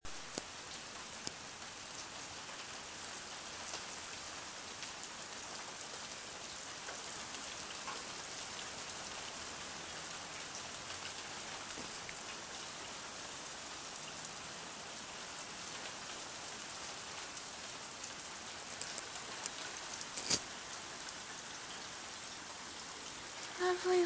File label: lovely cool rain